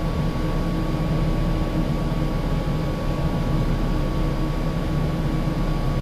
ventilation system

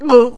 drown

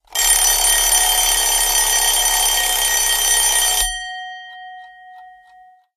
clock alarm

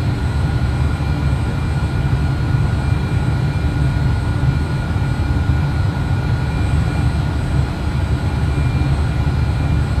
jet idle

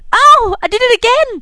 hehe

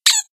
toy squeeze

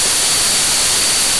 steam leakage

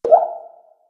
water droplet single

